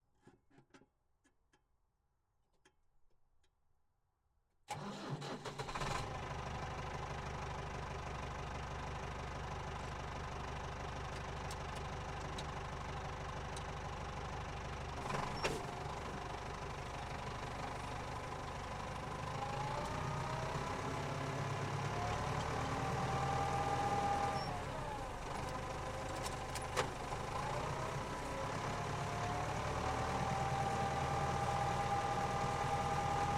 Ford Tractor - ONBRD - SLOW - Start Drive Stop Reverse Stop Drive Stop Off - ENGINE, Ford 7700; 1979; Transmission; DPA4061